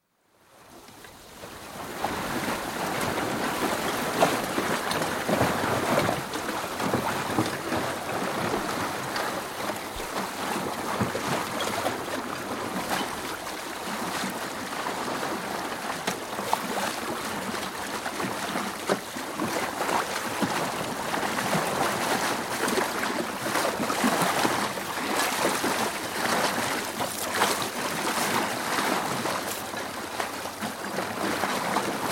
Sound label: Sailing Dinghy, sailing, constant run (Recorded on board); Boat Sound Effects; BBC